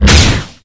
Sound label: Vehicles